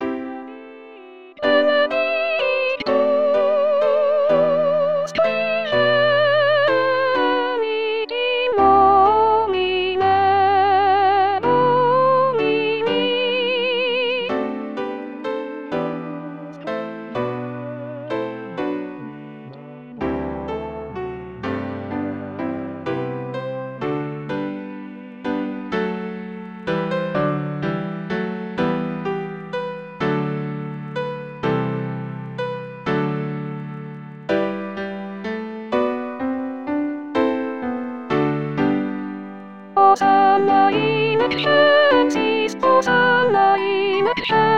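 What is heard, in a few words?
Benedictus Soprano, rehearsal tracks christmas; Corp Christmas Mass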